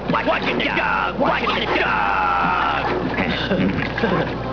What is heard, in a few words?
WASH